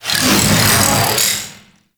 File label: wave ability proj by; Enemies; Abilities; Warframe; Duviri; Dax Knight